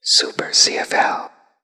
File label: whisper solo; Quik